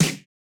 snare, snare house

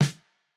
snare; Reference Beat BPM120; j city pop 1980

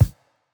pop kick

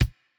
kick; Reference Beat BPM120; j city pop 1980

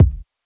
Jazz hop kick; Hiphop

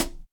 Rock hihat; Reference Beat

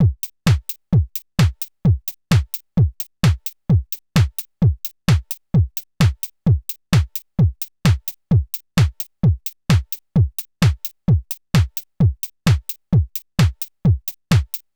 Korg loop 130; Electro; Micro korg

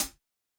hihat, house

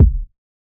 Hiphop kick; Reverb+EQ+Comp+Limit

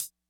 hihat; Pop; funkvol1

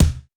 kick, house

